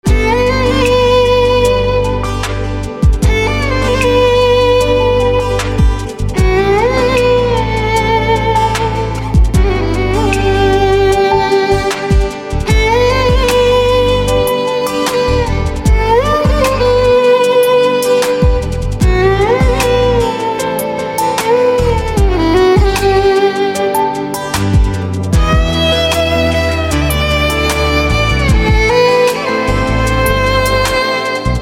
Violin

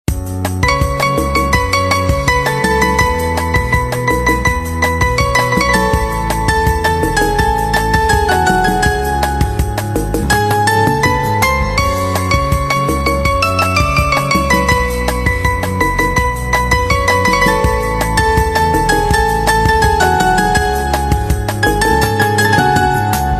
Piano

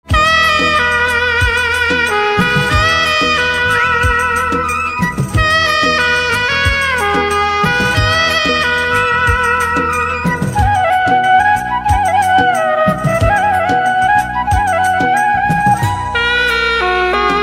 Saxophone Instrumental Ringtone

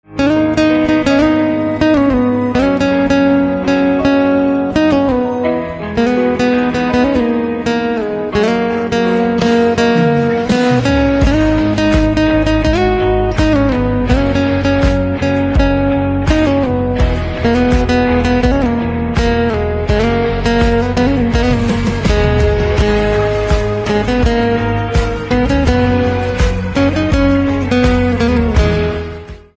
Guitar

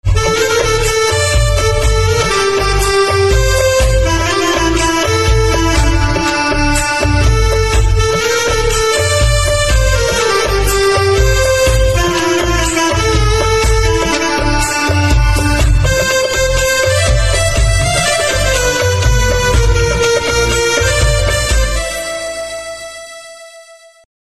Harmonica Instrumental

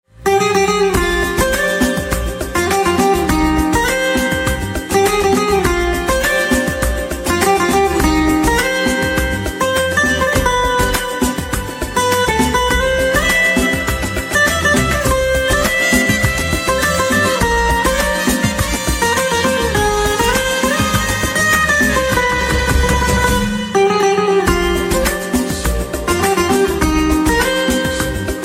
Guitar

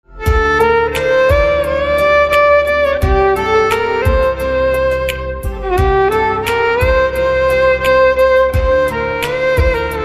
Violin

